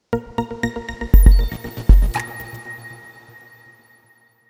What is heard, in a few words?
Short Clean Logo